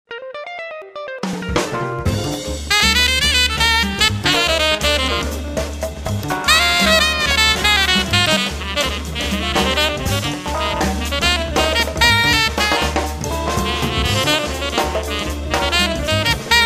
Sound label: Jazz Bar; Samsung GT-M2710 BeatTwist